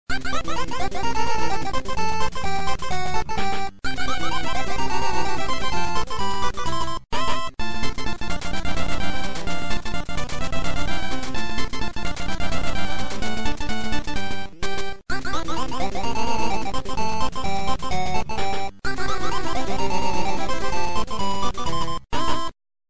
pinball; kirby

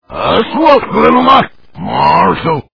train closer; reverse

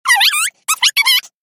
clock

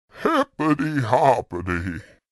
hiphop

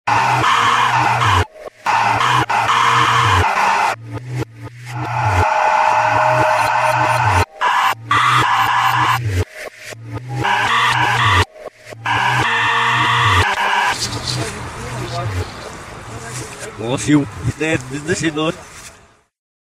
deathwhistle, reverse

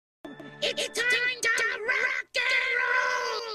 rock and roll; cave

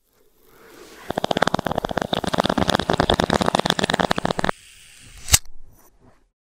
bong, reverse

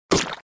splat

